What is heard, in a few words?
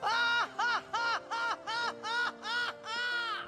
laugh; tidus